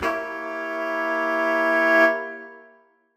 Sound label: Horn Swell Gdim; undercover samples